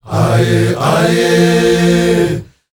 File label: AHYEH, World Voices, S.AFRICAN; ILIO; Vocal Planet VOL-5